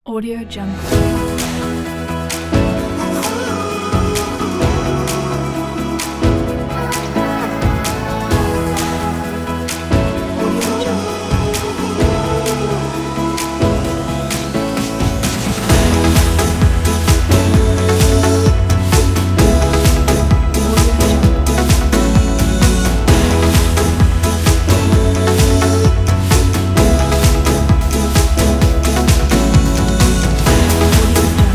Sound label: summer pop; smartsounds